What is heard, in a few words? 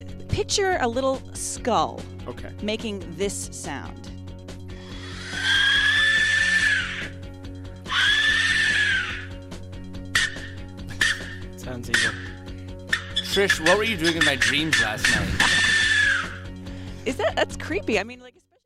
whistle; on a Canadian highway